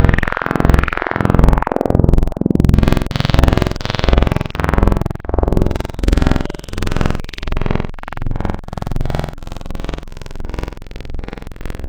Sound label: Echo Saw; Synthetic's Serum Collection; Echo Sound Works - Modular